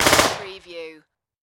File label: Submachine Gun FN P9; Sound Effects Bible